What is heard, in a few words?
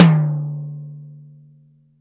TOM, Drum Dry; East Collexion; Drum 1